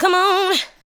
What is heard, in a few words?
ADLIBS